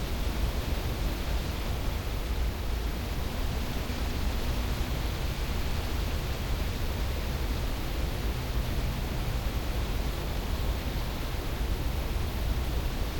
Ambience Buitengebied

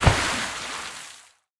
SFX - Duik In Water

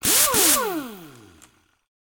Uitbouw Geluid

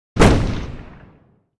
Explosie

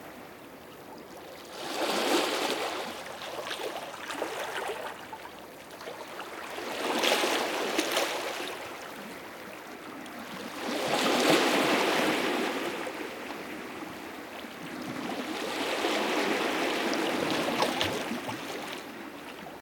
SFX - Zeegolven Geluid

